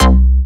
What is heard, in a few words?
Bass Shot C; Mutekki Media; Vengeance Electroshock Vol.2; Bass Shots